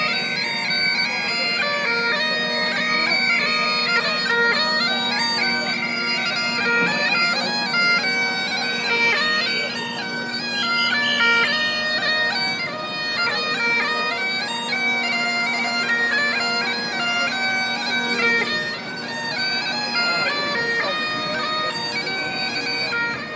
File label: bagpipes whistles and vuvuzelas as firefighters march through; waterloo to lfb hq